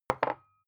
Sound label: glass put down tipping; objekte